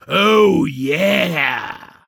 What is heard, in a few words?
Bar Cheering When Somebody Orders Drinks